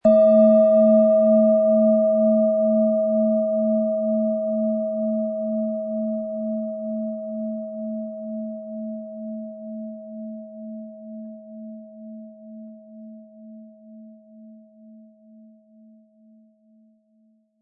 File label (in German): Planetenschale